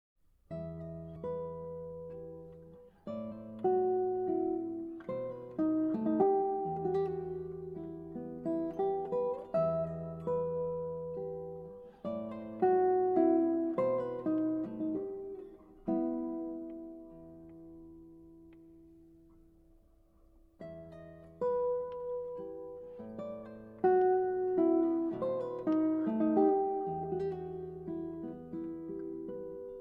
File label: Mazurka; Adelita